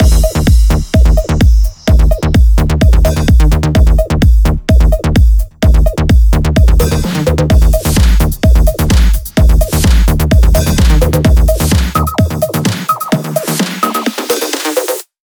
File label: 128BPM Mix; Vengeance Samples; VFH1 128BPM Crowded Kit